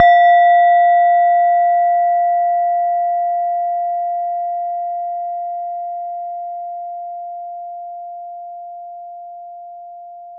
Medium Strike Short, Small Bowl, Tibetan Singing Bowls; Percussion Samples